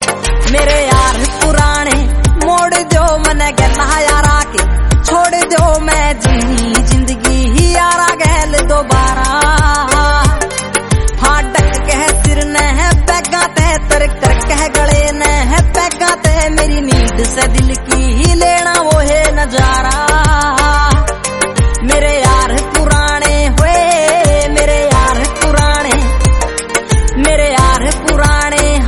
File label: new haryanvi song